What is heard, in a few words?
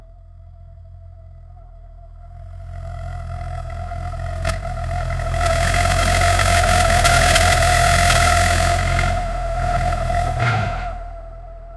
amg gt63 s 4.0l; automobile